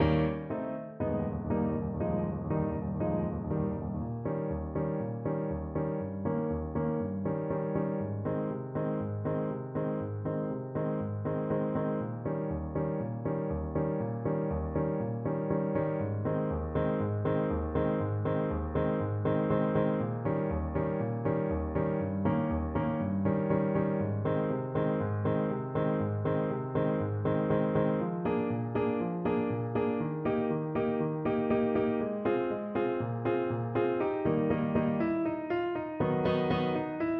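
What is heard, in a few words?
thunder alto sax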